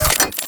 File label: Pod Shotgun Mech; Warframe; Weapons; Tenno